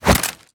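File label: melee attack gun medium; viper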